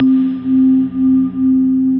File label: Glass Tapas, Effects; Ableton; Factory Packs; Punch and Tilt